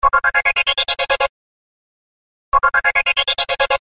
sms curtains ringtone

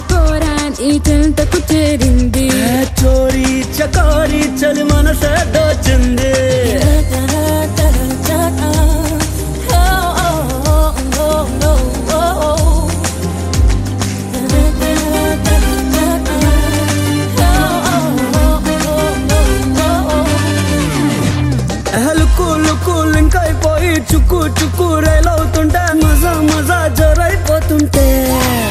mix ringtone

